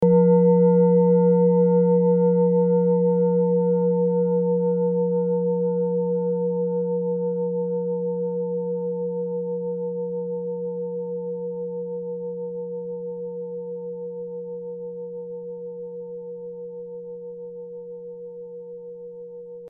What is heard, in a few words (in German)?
klangschale orissa